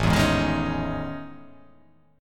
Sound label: A Major Sharp 11th